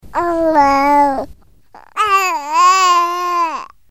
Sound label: baby voice